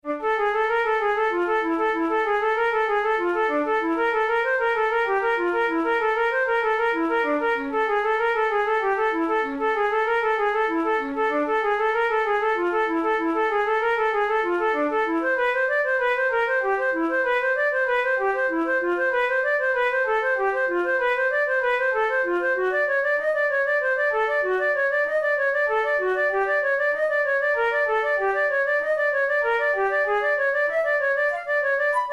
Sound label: etudes, flute